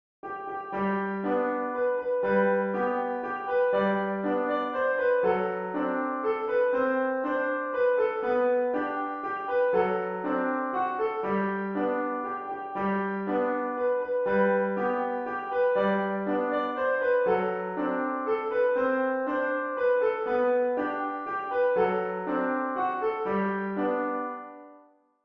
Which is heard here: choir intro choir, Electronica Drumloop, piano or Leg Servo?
piano